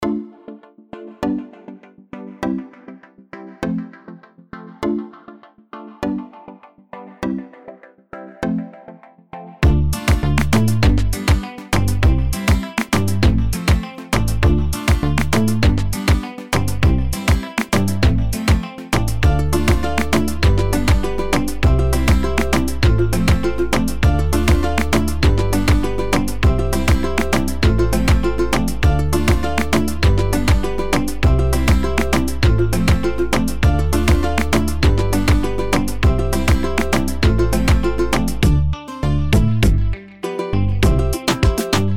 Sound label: urban reggaeton sample kit